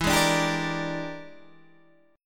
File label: E 7th Sharp 9th Flat 5th Guitar DADGAD, chord